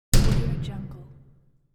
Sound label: metal door close